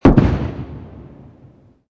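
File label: explosion medium; modern warfare 2; amb elements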